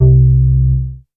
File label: C3, BASS; Q Up Arts; Dream Experience; BASS BANK 6